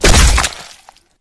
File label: bodyfall combat form; flood